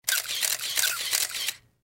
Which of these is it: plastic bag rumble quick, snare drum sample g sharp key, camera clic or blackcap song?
camera clic